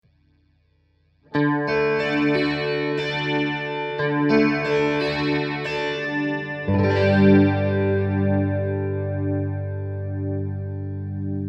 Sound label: TC1210 Delay Panning